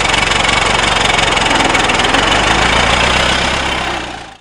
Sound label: engine; minecraft